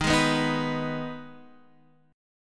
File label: D sharp Suspended 4th Sharp 5th; strummed; chord; x,6,6,4,4,7; JGuitar